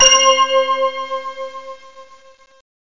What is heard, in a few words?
bells, h, keyboards; Zoom - Release 2; Active Software; la-bells